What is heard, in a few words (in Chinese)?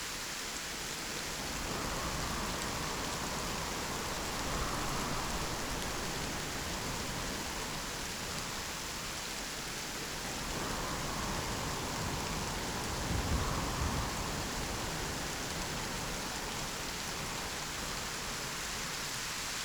生命之井&法师营地, 环境音效